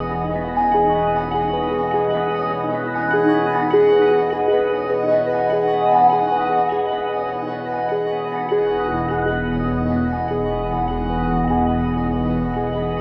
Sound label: Guitar Textures